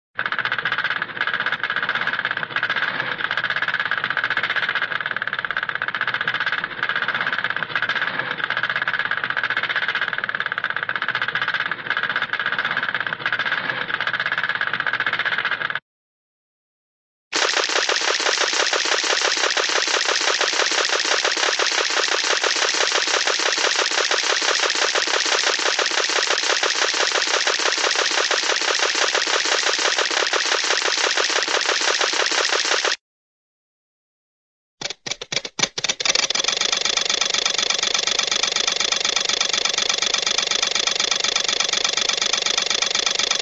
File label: motor, cartoon tiny assembly line